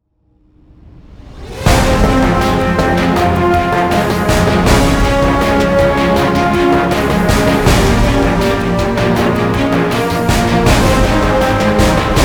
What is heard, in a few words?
preeminent reign instrumental